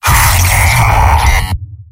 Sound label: soldier m pain severe; tf2; garrysmod; mvm